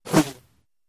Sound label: Arrow Whistle, bow & arrow